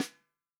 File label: snare drum, FUNK SNARE CHROMATIC; REAL DRUMS